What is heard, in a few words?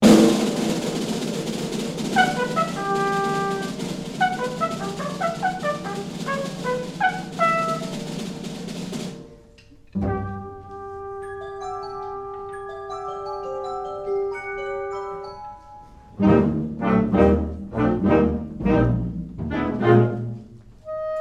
Praeludium for Jazz ensemble